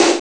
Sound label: snx drum; Amiga Soundtracker Sample Packs